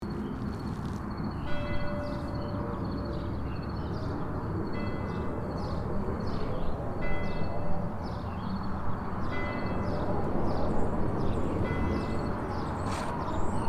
Foggy graveyard horror ambience exploration loop

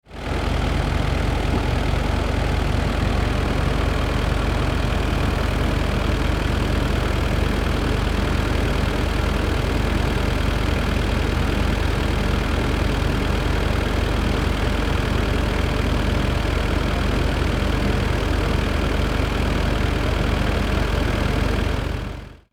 Excavator engine idle construction vehicle loop

